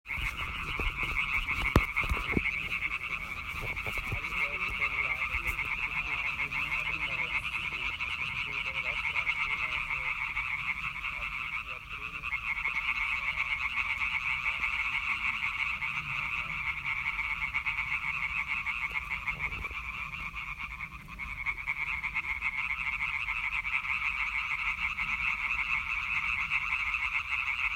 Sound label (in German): Froschkonzert; Oberlunkhofen